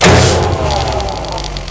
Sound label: switch off, tesla weapons; nzp